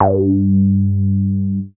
G2, BASS; Q Up Arts; Dream Experience; BASS BANK 15

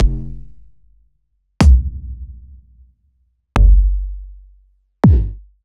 kicks

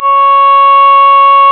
C#, Synclav FM, WIND; Big Fish Audio

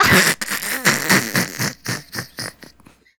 Female Laugh, Hip-Hop and RnB Vocals

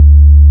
CLUB BS D3, CLUB BASS

